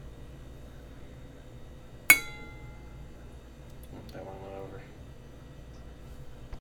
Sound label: Lamp Ting